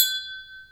knocking glass - IDFT(abs(DFT(sample))) post-processed by cepstrum method